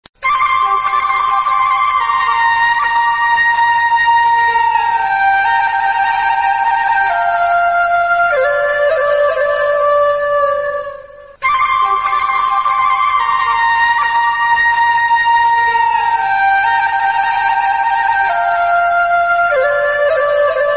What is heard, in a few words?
instrumental flute